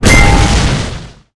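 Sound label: shield death; monster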